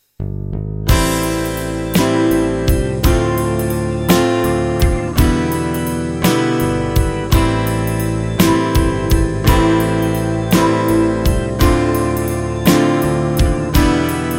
7th Chords

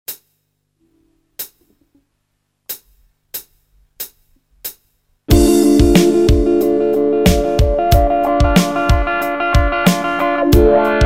Using Effects, guitar

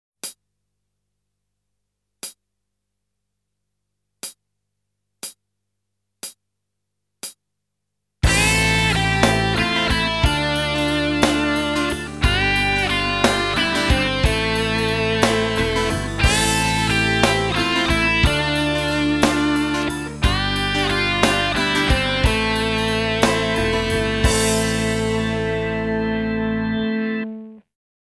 Natural Minor Scale

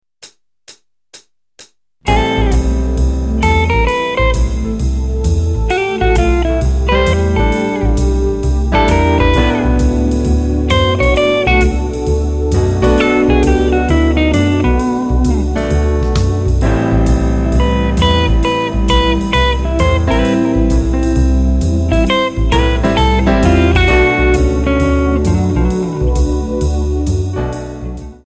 Jazz Soloing

